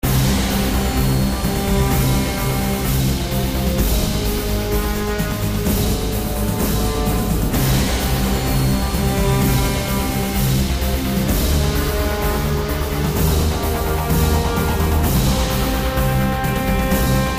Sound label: Knife Fight; Counter-Strike Condition Zero